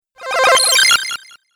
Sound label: Retro Random Bleep Accent; Video Game Sound Effects